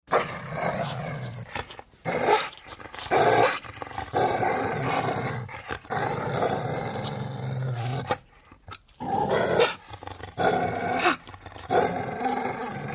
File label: Angry Lrg Dog Growl Sn